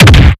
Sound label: Smash Hit Heavy